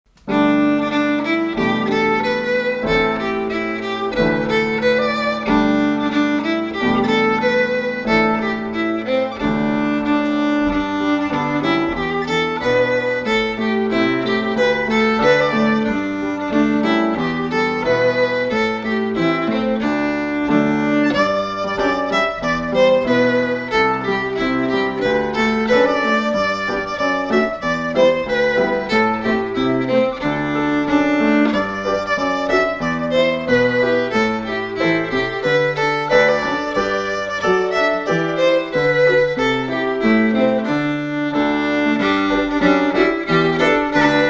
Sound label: Maris (Fast), fiddle